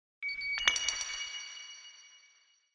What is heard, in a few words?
UI comform sound